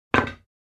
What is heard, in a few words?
Snooker pool cue drop on pool table